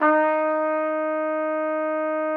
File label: TROP D#4, TRUMPET; Xsample VOL-3